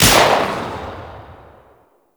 Pistol Shot; Warframe; Weapons; Tenno; Cowgirl Pistol

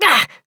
Pain, Adult Operator; New War

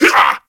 Kubrow Feral Grineer Finisher Grineer Male Grunt; Enemies; Warframe

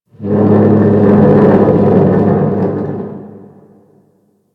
Ice Metal Groan, Ambience; Warframe; Corpus Ice Planet

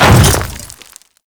Hammer Boss Step Dirt, footsteps; Warframe; Grineer Hammer Boss

